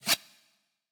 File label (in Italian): Lama